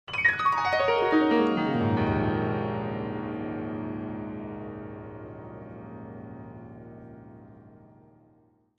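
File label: Grand Piano Black Keys; keyboards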